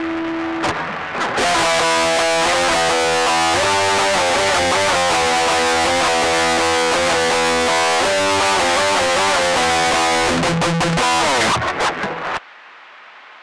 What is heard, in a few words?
unproc gtr